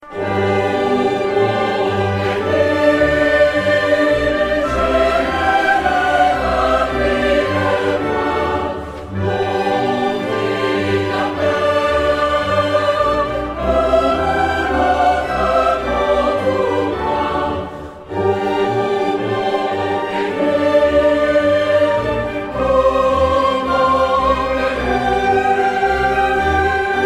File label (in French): choeur; appel; 2011 festival mon pays